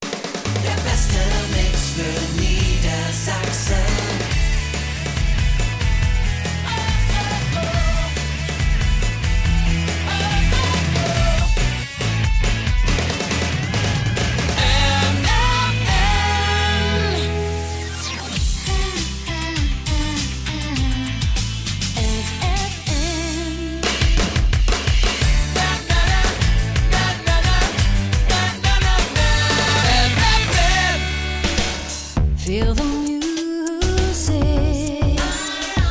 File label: Jingles; ffn